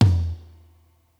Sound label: Rack, Kit; One Shots; Touch Loops; ClassicBreakSessions